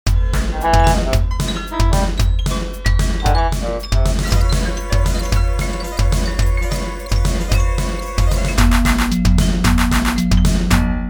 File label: frustrated music